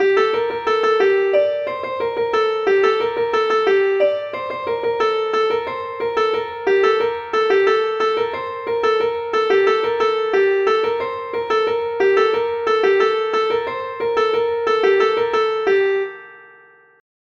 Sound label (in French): suite ronds